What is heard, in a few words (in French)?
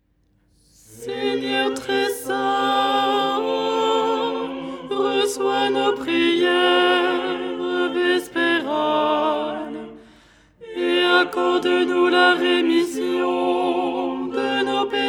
alto